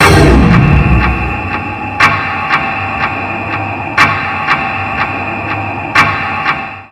time stop; calamity

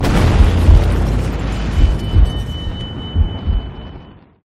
impact; hoi4

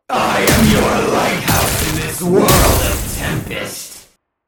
echo; gasharpoon

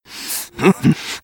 kill; freak fortress 2; some clean trash

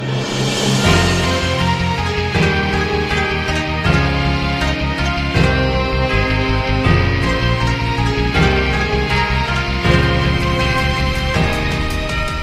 intro music; freak fortress 2

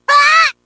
stab; freak fortress 2; kirby

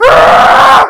death; freak fortress 2; sammy sonic fan

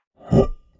knight jump; the theo plan; theknight

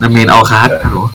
win

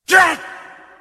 ultra kick; freak fortress 2; laszeski

